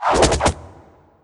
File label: Human Hunter Fall